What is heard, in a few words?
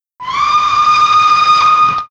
steam